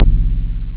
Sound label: log